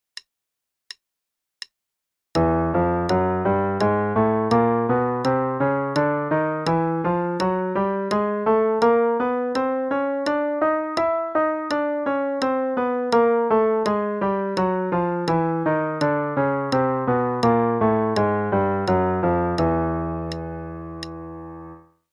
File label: Chromatic Scale on E, 2 octaves (qn=84); CM Level 6